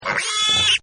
PIG; Partners In Rhyme

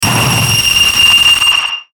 Hit Noise Static, Hit Noise Static Squeal; Blastwave

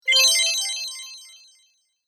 Fairy Magic Spell; Magic Sound Effects

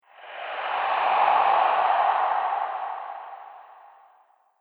Gruesome Ghost Monster Discharge; Ghost Sound Effects; Haunted House

